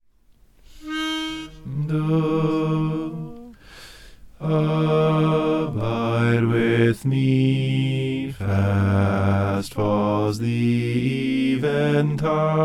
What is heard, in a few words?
bass